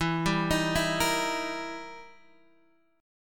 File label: E Minor Major 7th Flat 5th E down Guitar Standard; arpeggiated; JGuitar